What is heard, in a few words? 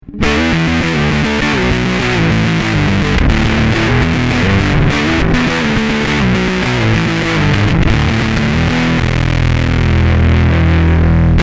blast